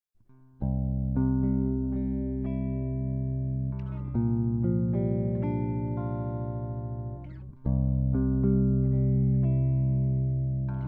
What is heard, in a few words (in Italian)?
7 4 Improvvisazione